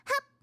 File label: jump, Catgirl Fighting SFX